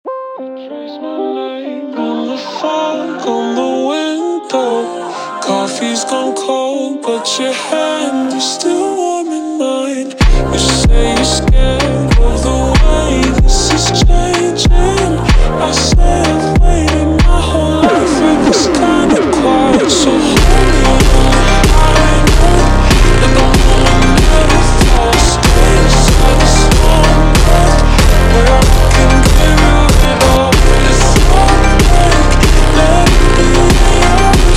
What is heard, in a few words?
High Note Jumpstyle ultra Slowed